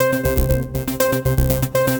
Seq Simple Funk, Drum & Bass; Sonic Foundry (Sony Creative Software); Knutrix Drum & Bass

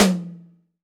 TOM, AMBIENCE TOM

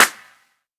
pop snare; Drums

